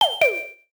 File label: SFX DS CLOSE